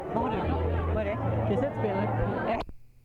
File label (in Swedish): kassett